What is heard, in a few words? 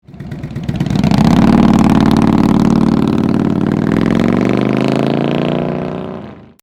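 TAKEOFF, motorcycle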